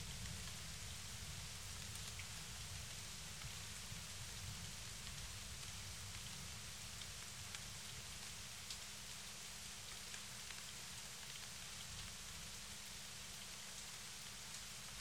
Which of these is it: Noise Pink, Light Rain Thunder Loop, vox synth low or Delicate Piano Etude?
Light Rain Thunder Loop